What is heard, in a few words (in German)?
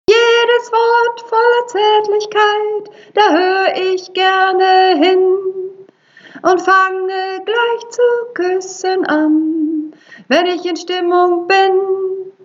Sopran